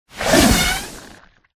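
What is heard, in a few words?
centipede buff; skolopendra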